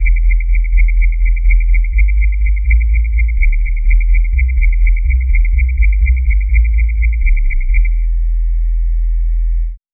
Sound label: whistle bass